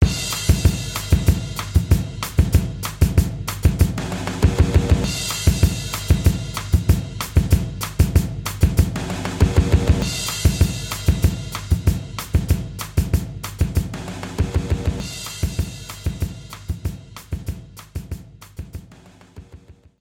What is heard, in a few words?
bgm summer battle; World Flipper